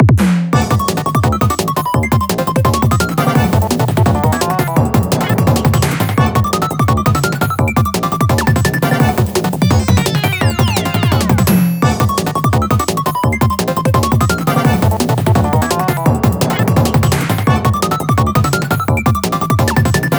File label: Power Up!; pac man galaga dimensions 2020